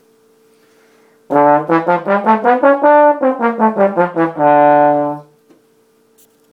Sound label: trombone